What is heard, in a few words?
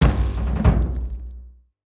Combat Starts No Beep